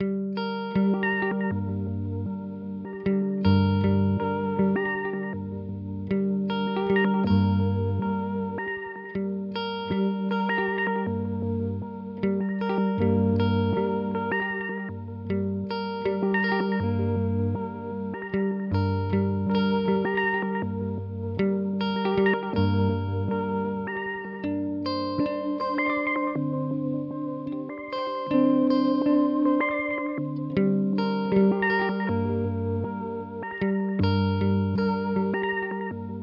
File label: Thermae Modulation Step; Chase Bliss Audio